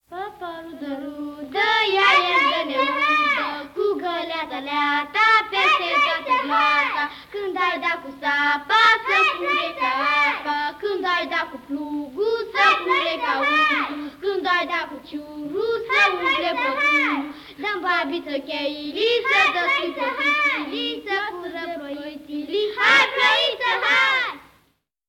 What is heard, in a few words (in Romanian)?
paparudele